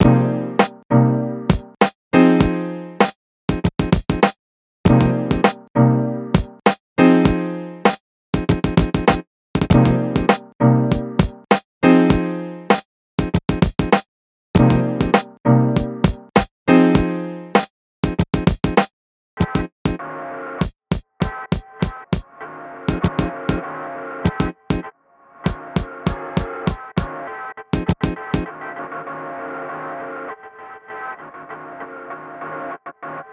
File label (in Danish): i seng beat